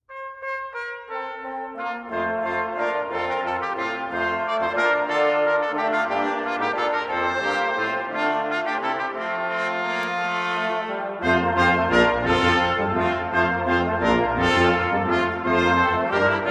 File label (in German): in dir ist freude brass